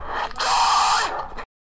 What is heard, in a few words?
yell